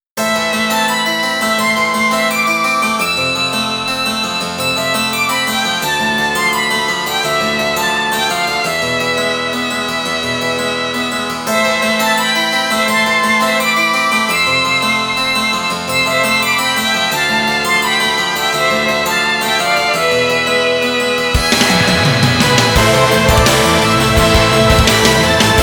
Instrumental

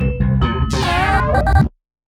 FILL, PsyTrance

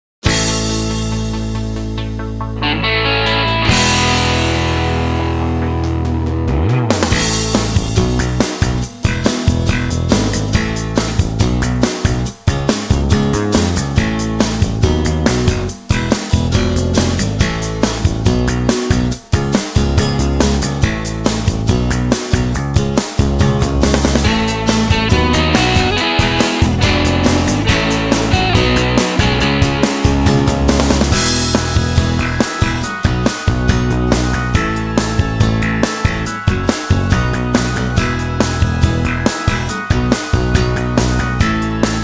Karaoke Ver.

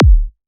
Kick; LiLA'c Records PsyTrance Sounds 01

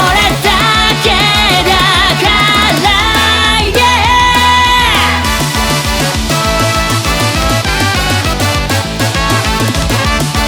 Eurobeat Remix

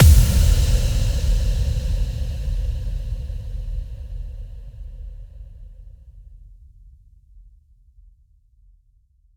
Impact

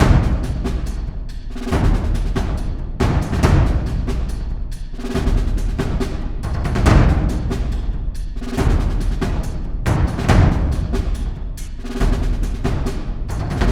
Orchestral Pack For Epic Trailers

